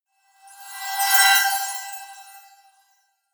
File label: sweep